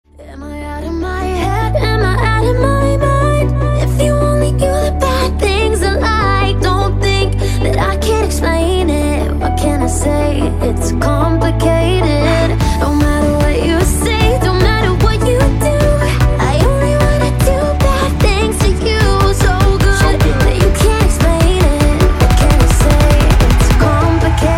Piano

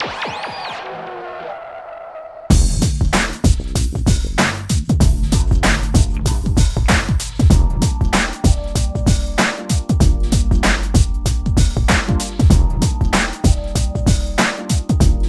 Nokia scratchy

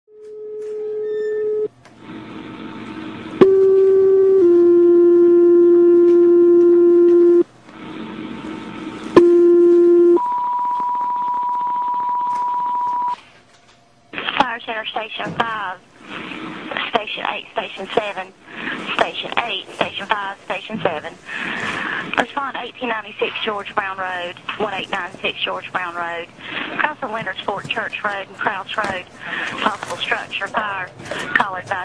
engine 74 responding to a structure fire

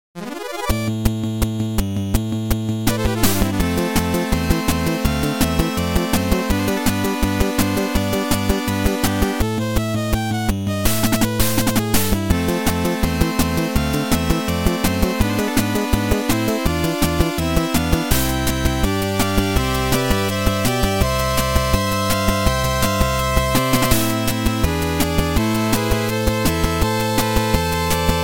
8bit Battle, ringtones

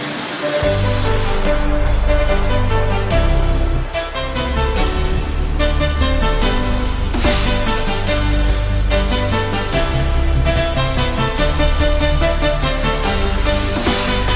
jingle bell; animals

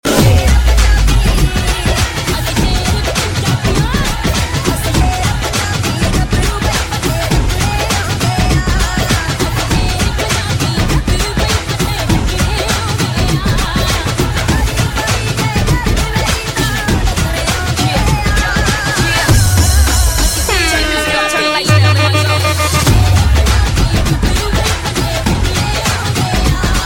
punjabi, rock

